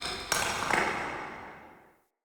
Alley Distant Rock Crumbles; Ambience; Under City

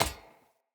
Unarmed Fey Parry Impact; Weapon Impacts; Player; Combat

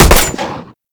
Fire Mech; Warframe; Weapons; Strun

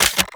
Auto Crossbow Equip; Warframe; Weapons; Tenno

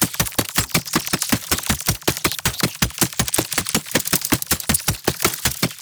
Suicide Spiderling Movement Loop; Enemies; Gameplay; Boss; Warframe; Oraxia

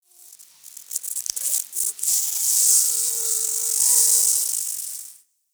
Forest Close Insect Buzz Close; Warframe; Grineer

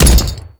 Ghoul Devourer Step Default, Footsteps

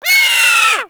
Sea Bird Vocal Death; Characters; Warframe; Ostron; Hunting Critters

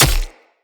Short Sword Medium Impact Flesh

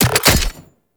Concrete Archgun Charged Mech; Warframe; Archwing

